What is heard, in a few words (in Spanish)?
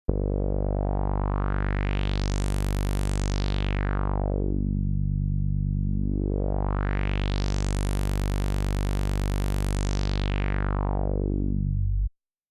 Bajo filtro